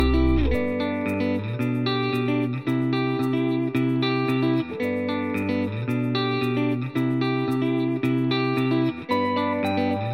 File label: ambient